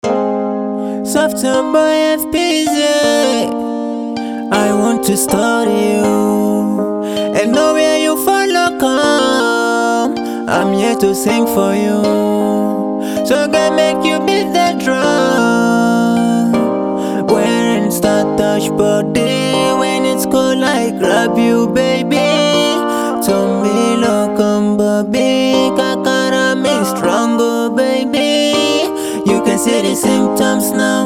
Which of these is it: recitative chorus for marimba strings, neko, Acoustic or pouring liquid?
Acoustic